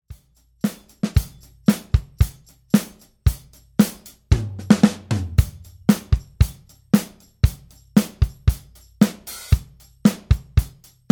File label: 80s pop